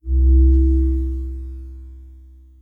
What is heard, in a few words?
Power Up